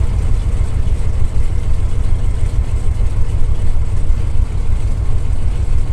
bearcat cockpit r, engines; War Thunder

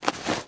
foley chemlight lift

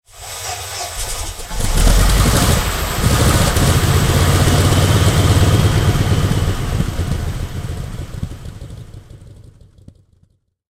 sherman m4a1 engine start, tanks; War Thunder

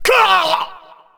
hurt heavy

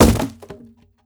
wood; Rust

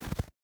footstep boots snow jump land; Rust

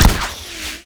tarantula rocket fire

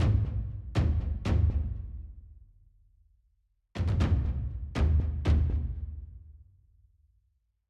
music interactive; World of Tanks

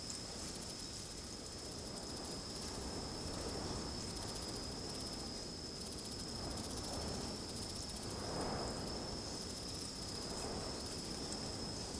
ambience desert forest night calm dry; Rust

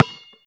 basketball dribble; Call of Duty Modern Warfare

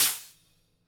Aircrafts Su-27 Canopy Stop; DCS

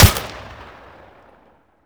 protogun 1shot

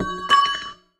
cannon shell drop; War Thunder

